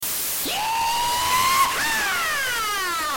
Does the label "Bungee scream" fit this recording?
Yes